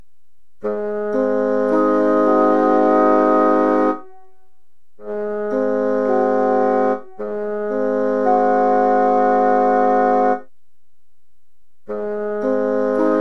diaschisma 6th chords

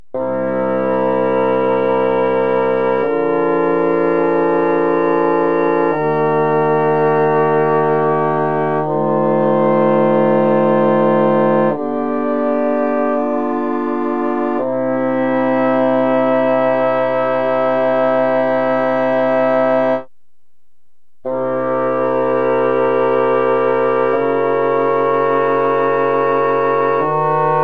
7th chord progressions

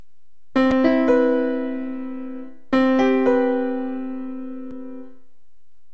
condissonant

